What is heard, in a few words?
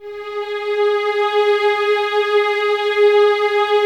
G#4, 11 Violins, Soft; Miroslav Vitous